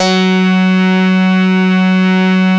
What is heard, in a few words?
700 DUET F#3, Korg; Back In Time Records; Korg Universe VOL-1